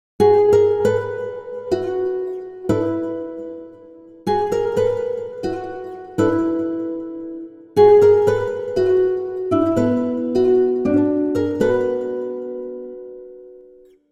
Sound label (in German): Gitarren Djingle